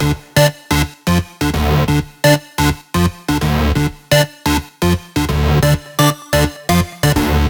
128BPM Synth Root D; Vengeance Samples; Beatdancer Kit

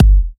Kicks; Vengeance Samples

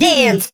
Vocal Phrases; Vengeance Samples; Vocals

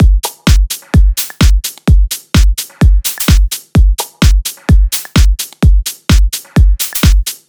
128BPM Drums, Tech House; Titano; Vengeance Samples; Bonus TechHouse Kits

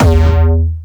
Jumpstyle Kick E2; vengeance essential club sounds-3; VEC3 Bassdrums

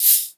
Shaker; Vengeance Samples; VEH1 Percussion

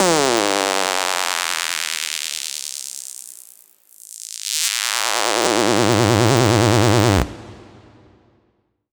FX Scratching; vengeance essential club sounds-3

